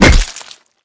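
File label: knockout punch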